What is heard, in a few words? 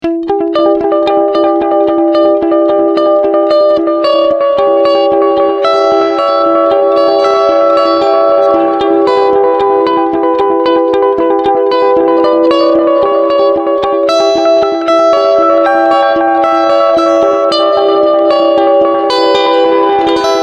Preset Space